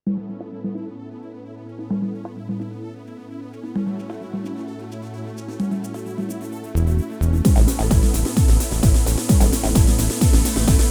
tyros3 style dance ibiza, yamaha

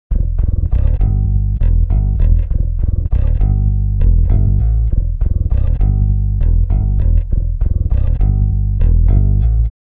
bass; usb uvi workstation

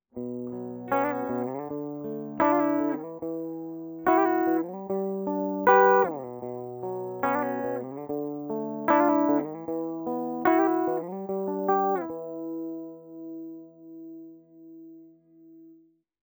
clean bridge arpege, guitare; vintage electric

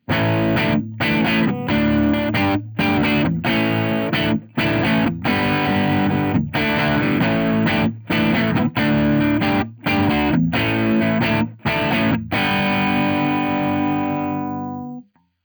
crunch middle, guitare; vintage electric

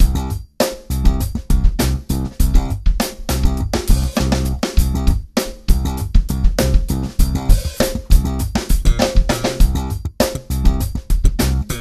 virtual bassist funky; steinberg

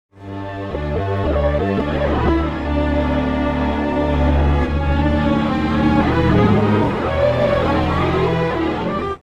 sample tank stretch -2, strings pitchshift; ikm

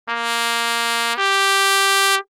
porta fast, trumpet; samplemodeling

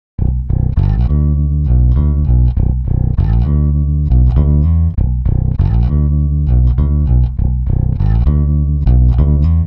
bass, pitchshift; i3dsp quattro x

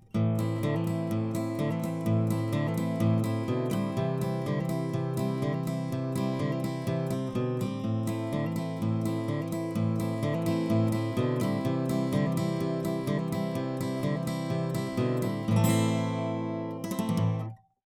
guit am; akg 214

